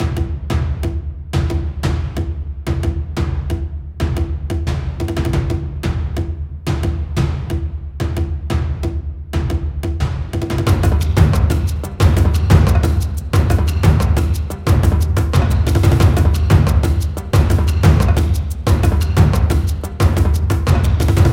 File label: 90Bpm, Percussion; Percussion Kits; Ghosthack; SkyRealm; Leap Of Faith